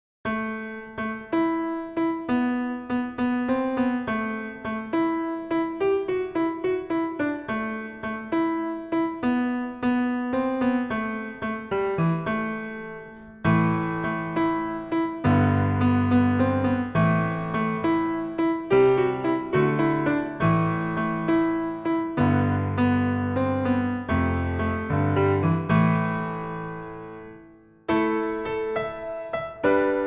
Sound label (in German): Irische Taenze